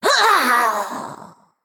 Female Naga Death

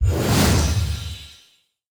Party Portal Death

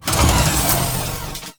Underlay Death; Subject9

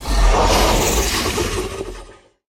Mistrunner, Play

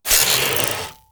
Boom Bot Attack

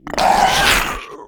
Crawler Attack; Gollaka Crawler

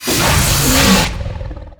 Hungry Naga Attack

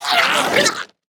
Curious Glimmerroot Death

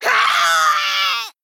Female Goblin Death

